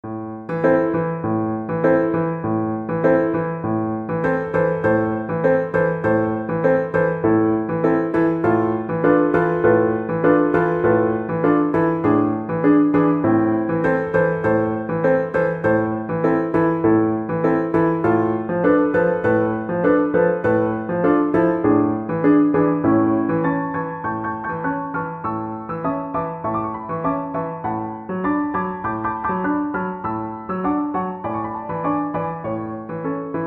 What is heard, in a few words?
habanera BSN, Bassoon